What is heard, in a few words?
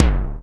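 Electric Drum C1; Digital Sound Factory Xtreme Lead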